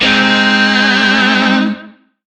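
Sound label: Shawzin Minor Chord I; Music; DE; Warframe; Lizzie Shawzin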